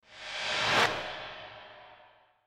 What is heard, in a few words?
Swish